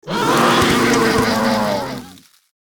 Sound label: Frozen Stagguard, Death